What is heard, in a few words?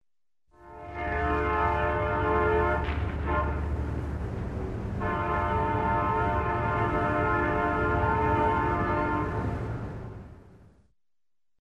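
diesel locomotive sound, horn in the distance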